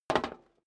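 step boots single metal dry single stair down, footsteps; schritte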